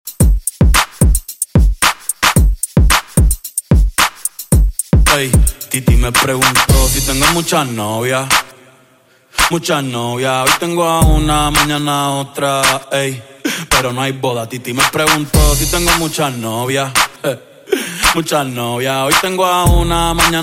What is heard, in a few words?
Latin Hiphop X Moombah